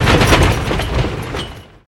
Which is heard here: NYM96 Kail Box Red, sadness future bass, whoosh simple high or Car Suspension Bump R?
Car Suspension Bump R